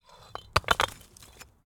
brick bricks shuffle impact several many moving laying cement hit fall; sonniss gdc